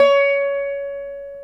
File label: veena C#6; Spectrasonics; Heart of Asia